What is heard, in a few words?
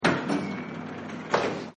Cash Register; Cash Registers; Bars